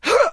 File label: Jump